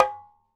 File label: Requinto Hit, Latin Percussion; Sonic Foundry (Sony Creative Software); Joe Vitale Latin Percussion